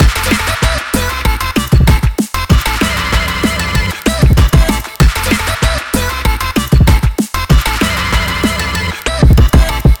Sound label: jam; うろん